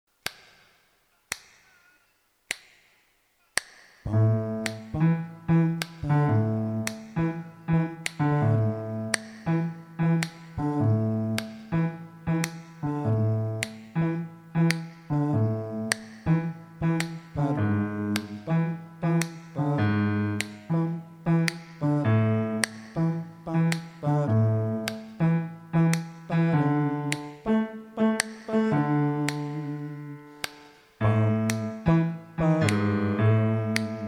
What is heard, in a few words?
Happy Birthday Low Part